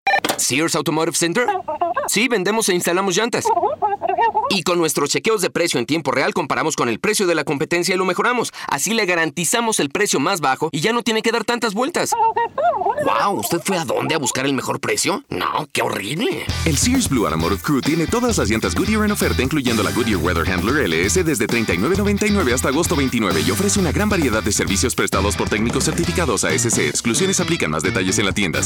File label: Murmur